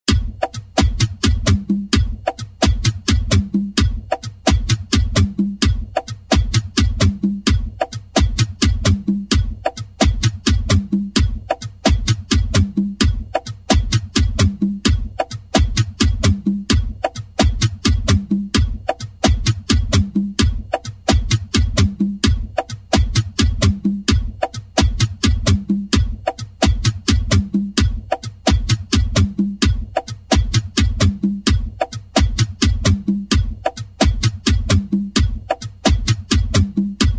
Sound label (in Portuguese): Base tambor